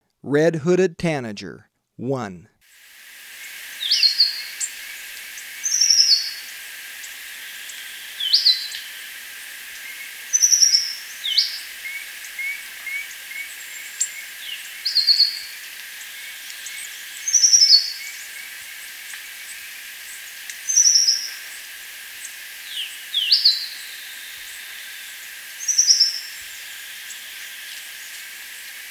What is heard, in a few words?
Red-Hooded Tanager, Birds of Ecuador; S. America